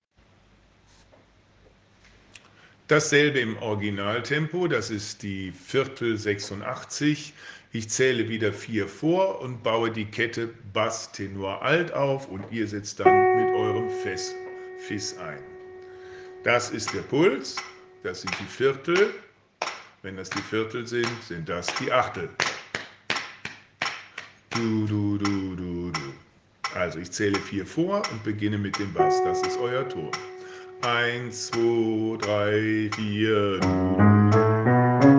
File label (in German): Sopran originaltempo